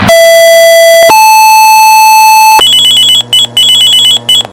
Fire tone and pager

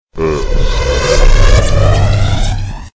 Rocket Sms

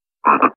Single Frogs Ribbit

